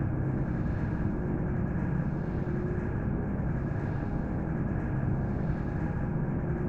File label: ambient primitive station idle; Stellaris